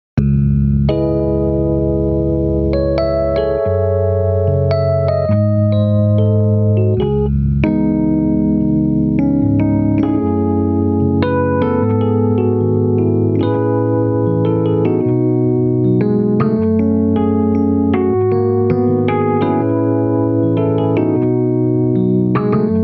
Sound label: Struck Rhodes